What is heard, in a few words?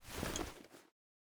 equip; snds jack gmod